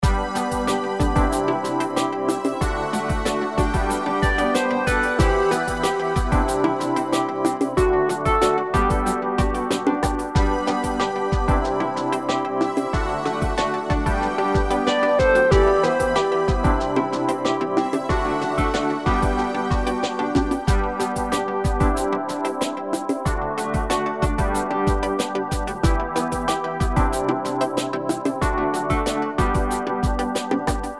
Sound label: Simple Positive Loop